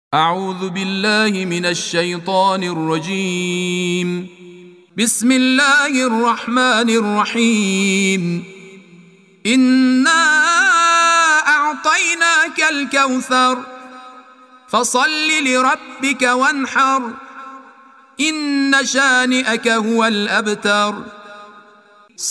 تلاوت ترتیل قرآن کریم سوره کوثر

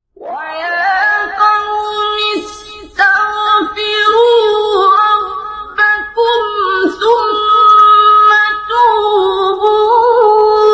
تلاوت زیبای آیه سوره مبارکه; 52; هود; استاد عبدالباسط

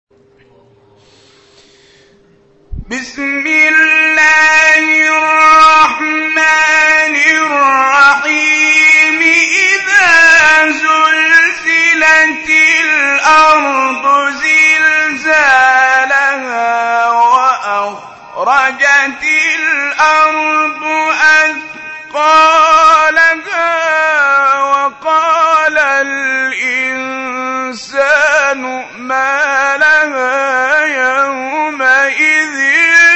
تلاوت زیبای سوره; زلزال

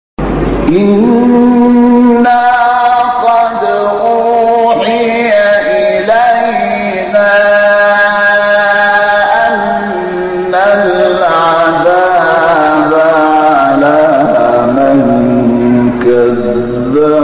تلاوت زیبای آیاتی از سوره; طه; استاد شحات انور

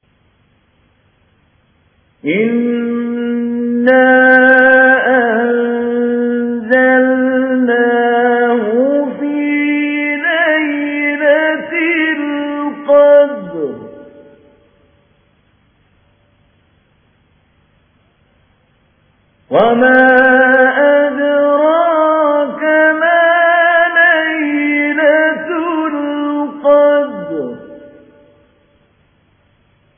قرائت مجود; محمود علی البنا; سوره قدر

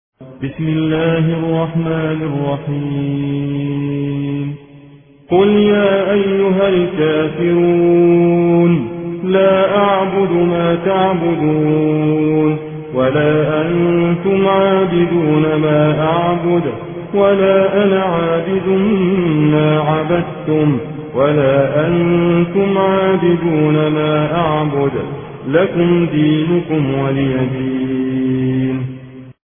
ترتیل روایت حفص عن عاصم; سوره کافرون

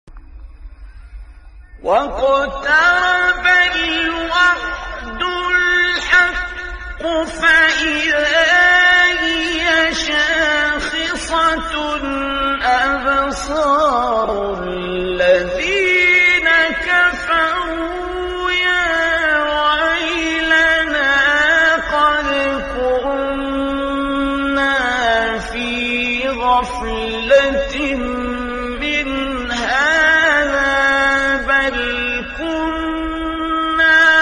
تلاوت آیه سوره; انبیا; استاد عبدالباسط

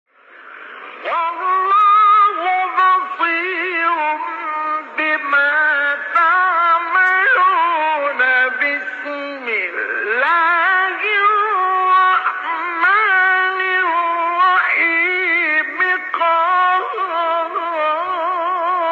فراز زیبای سوره ق; استاد مصطفی اسماعیل

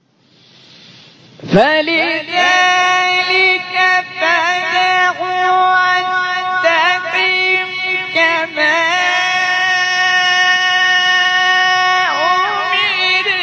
تلاوت آیه سوره شوری; عبدالعال

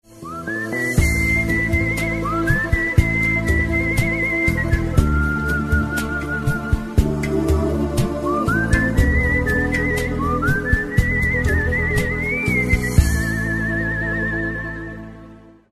Baroon rain

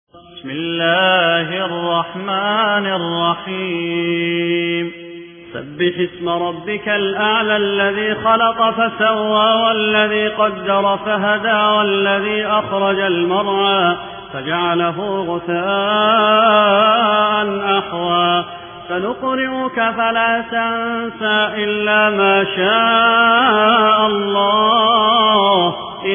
ترتیل روایت حفص عن عاصم; معیض الحارثی; سوره اعلی

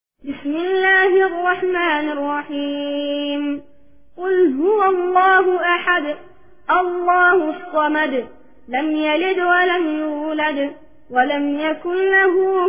قاری نوجوان یمنی ترتیل; سوره اخلاص

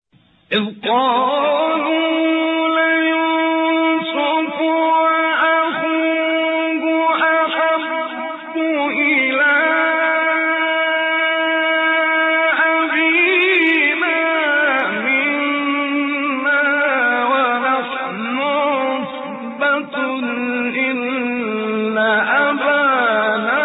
تلاوت زیبای آیه سوره; 8 9; یوسف

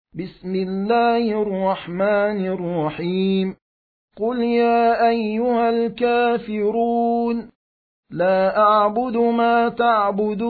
ترتیل; سوره کافرون

